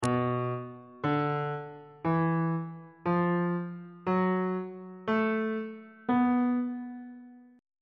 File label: b blues scale on bass clef